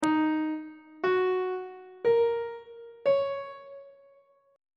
c sharp ionian 7th chord 2 is d sharp minor 7th on treble clef